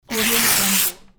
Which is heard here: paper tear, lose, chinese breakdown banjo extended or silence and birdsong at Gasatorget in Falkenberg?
paper tear